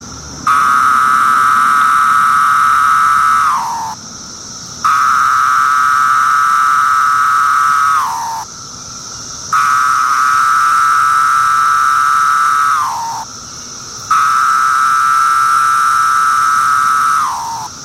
M septendecim Court I call, cicadas; Brood II

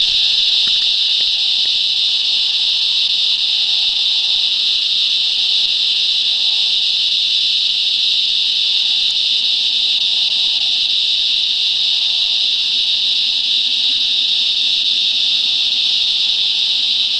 T resonans calling; cicadas

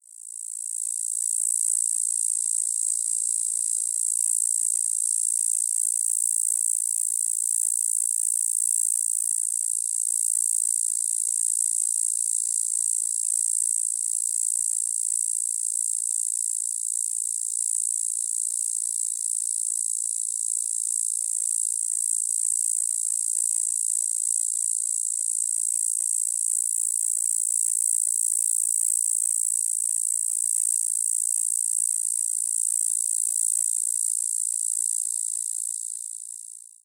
Okanagana fumipennis filtered; US; NM; cicadas

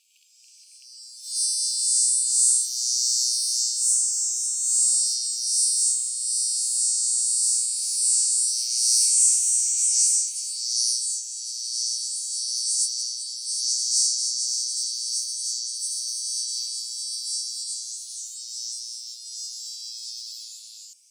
Hadoa bifida, cicadas

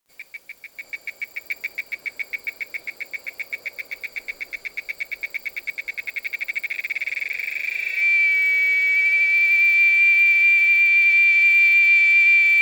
Quesada gigas, cicadas

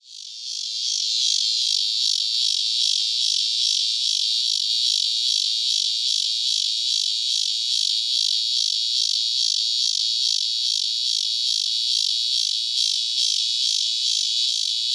Megatibicen pronotalis walkeri filtered; US; FL; cicadas

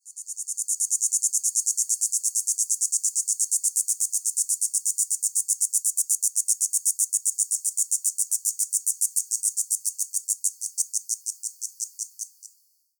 Diceroprocta knighti filtered; US; AZ; cicadas

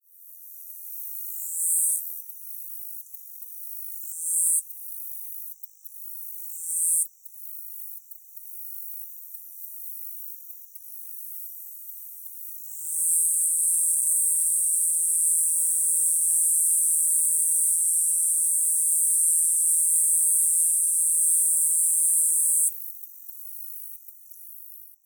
Diceroprocta delicata filtered, cicadas

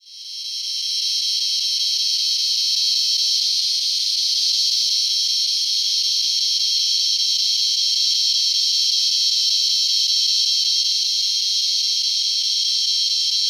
Megatibicen resonans fragment filtered; US; cicadas

